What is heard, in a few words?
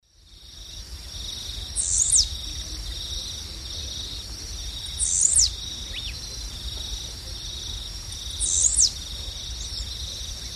Volatinia jacarina, song; S. America; Eastern Lowlands